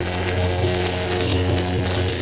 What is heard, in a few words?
electricity; russian sounds